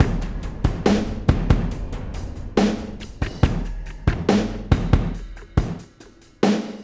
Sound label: SloMo Beats, Loops 140 BPM